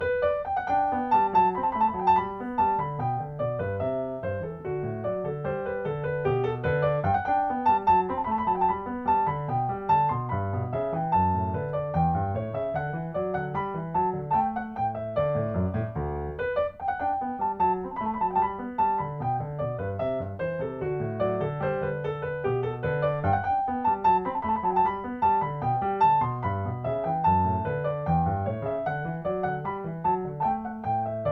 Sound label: Bourree; Bach